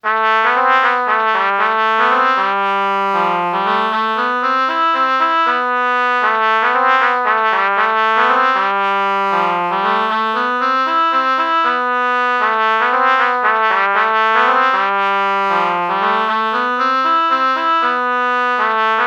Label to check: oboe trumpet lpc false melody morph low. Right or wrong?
Right